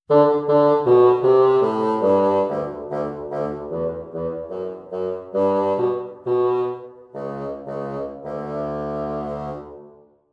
fagot

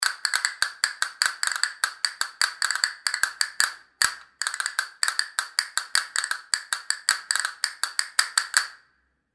castañuelas

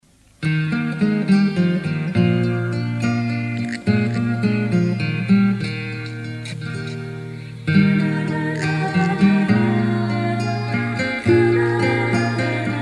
Esta versión instrumental de la rana fue grabada en cinta hace muchos años; estudio de grabación La Batuta

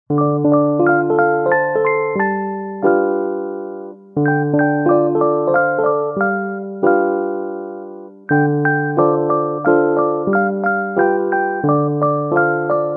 teclado

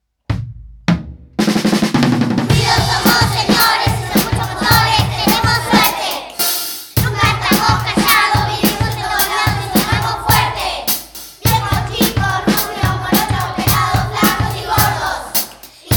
Este rap del ruido pertenece a la historia de la Bruja Inés y habla de la contaminación sonora

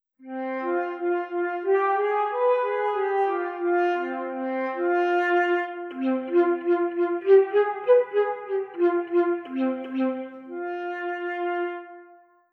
flauta

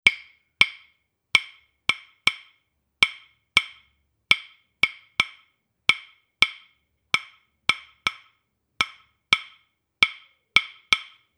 claves

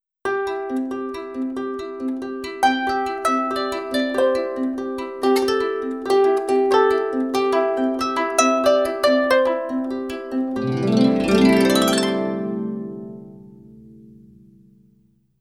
arpa

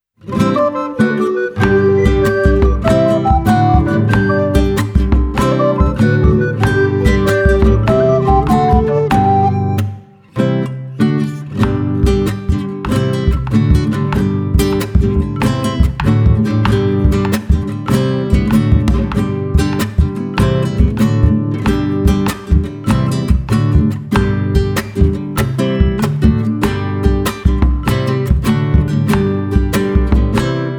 malambo instrumental

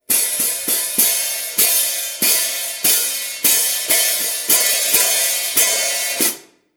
platillos; murguita; puerto de Montevideo

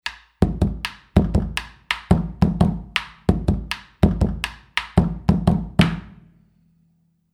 bombo; alegre carnavalito de la zona del Altiplano boliviano

